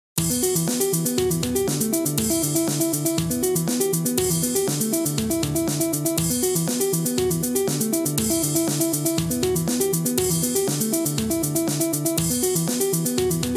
Ritmicheskij risunok Poliritmiya

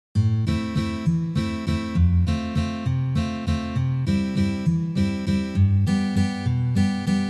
Valsovyj boj na gitare

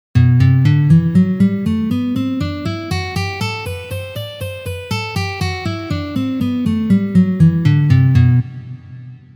Gamma Mi minor naturalnyj vid pozitsiya

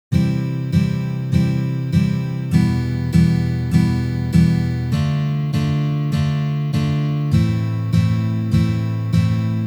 Uprazhneniya dlya igry akkorda Do mazhor na gitare

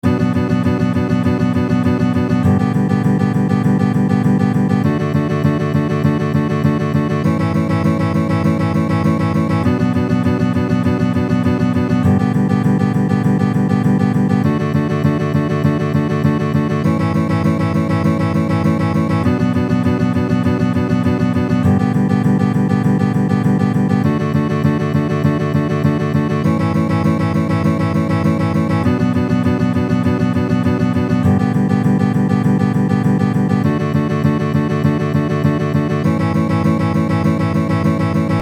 Boj Dvojka Pankovskij 200bpm

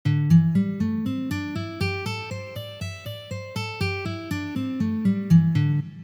Minornaya pentatonika 1 poz

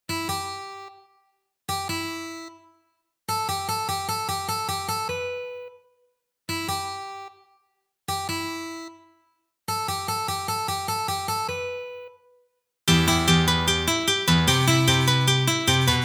na gitare na odnoj strune

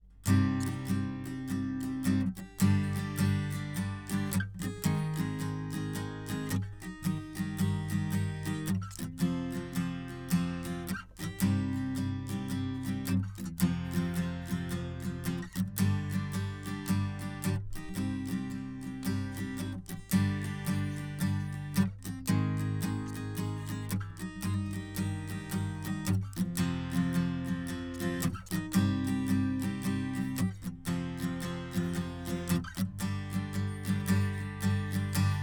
Akkord Cm uprazhnenie

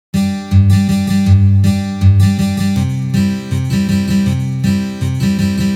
Boj Kantri na gitare

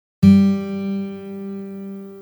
Tretya struna na gitare

